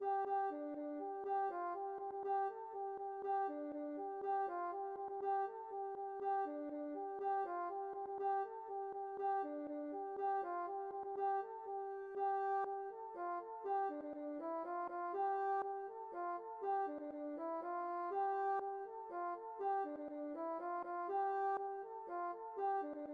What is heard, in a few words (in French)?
rond Landeda